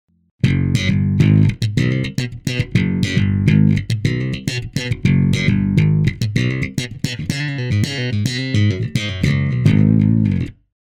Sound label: Compressed Slap; 4 Stroke